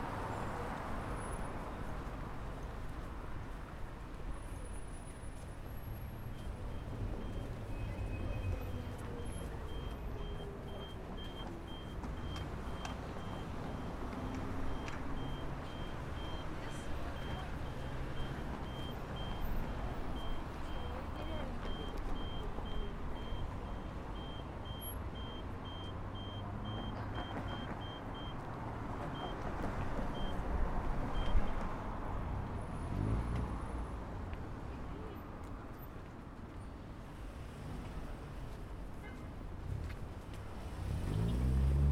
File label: Perm Walk, sirens; Crosswalk Signal; Sirens and Crosswalk Signals